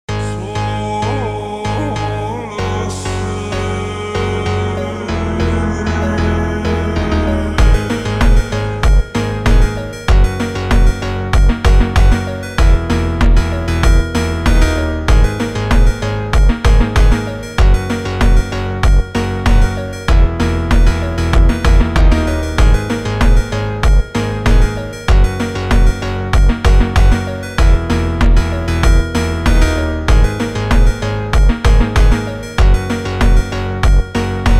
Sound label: Iranian funk